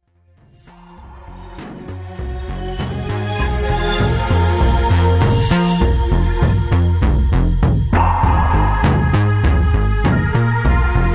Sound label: Synthhouse